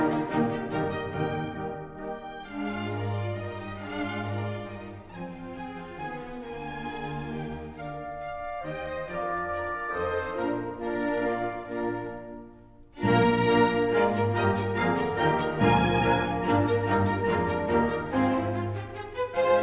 classical

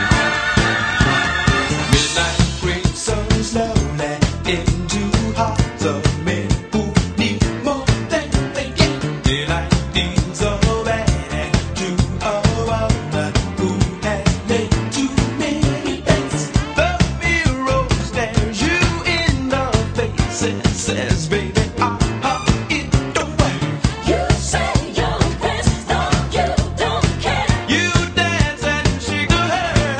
disco

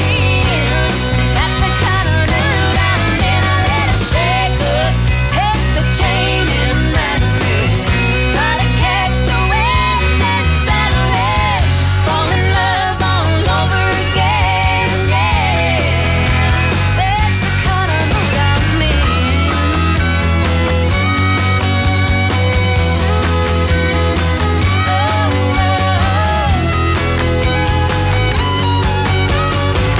country

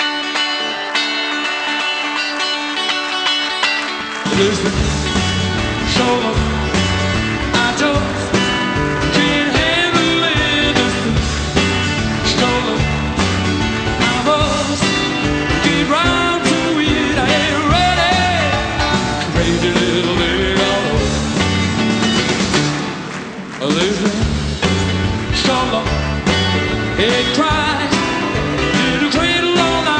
rock

